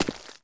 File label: mud, footsteps; player